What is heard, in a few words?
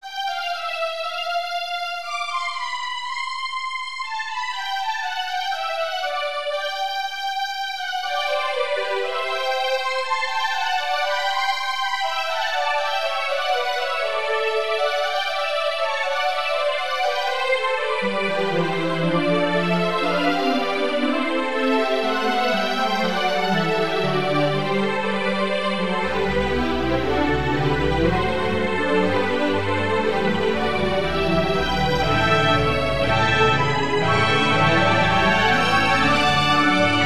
ndrkultur jingle